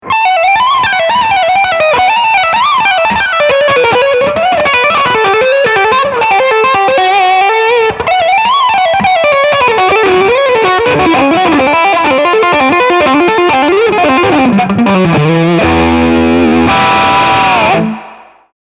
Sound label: BURN Channel